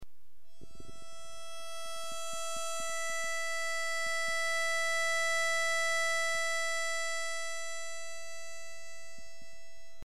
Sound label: E Natural High